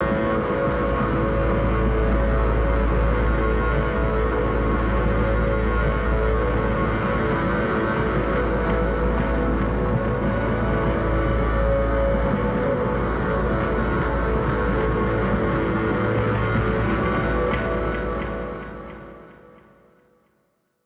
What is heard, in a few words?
granulated A1; Space Pipes